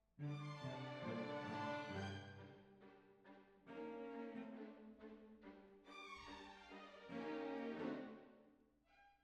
Cbasses

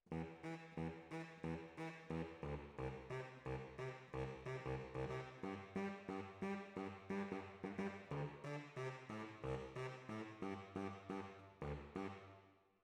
Alto, RNR for piano

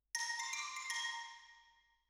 Xylo

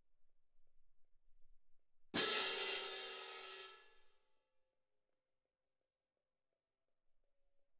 Cymb Close

